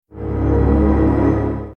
bloodbath effect; abilities

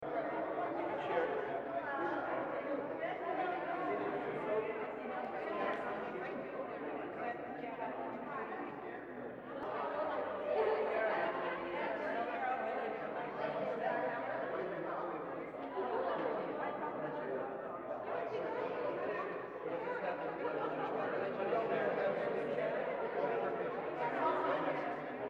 ambient tavern crowds

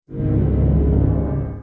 supress effect

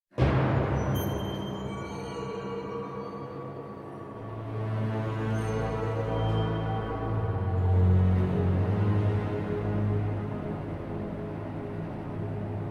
dungeon ambient

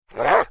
horde wolf attack, mobs

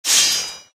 earth shaker effect

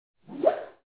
swish up

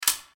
settings close

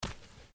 biped walk

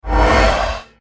abilities open

